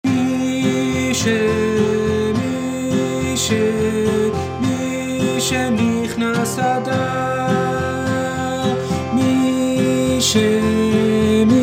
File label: voice; Mi Shenichnass Adar